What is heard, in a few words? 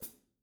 HH foot; apulSoft apTrigga2; Multi-Velocity Cymbal Samples